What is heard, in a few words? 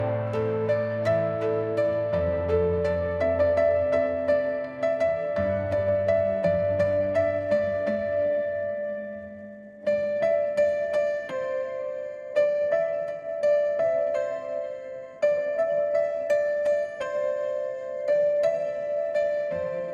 ringtone anime